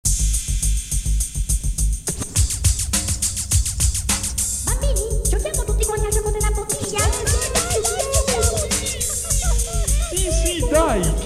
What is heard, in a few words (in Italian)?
Karaoke